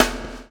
CHAIN; Spectrasonics - Backbeat

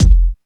KICK 2; East West; Phat & Phunky

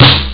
sdrum; ST-XX Amiga Soundtracker Sample Packs

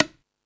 Latin Layers, drum machine; E-MU Pro-Cussion-1

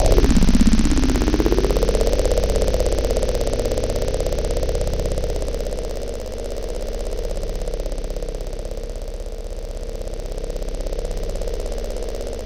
THE BUZZ, KURZWEIL; BASIC-KW2000

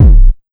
AMB 147 BD R, Big Beat Drumloops; AMBIENT 147; AKAI

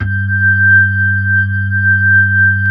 B-3, Hollow

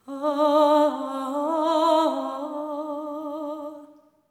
LAMENT; Spectrasonics; Symphony of Voices; LAMENTING

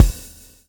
swing

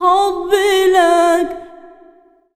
SINGER A, Arabian Traditions; USB Soundscan vol.43; AKAI

